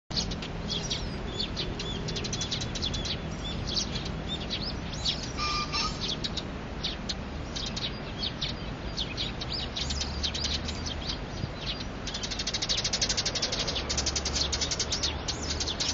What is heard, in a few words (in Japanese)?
suzume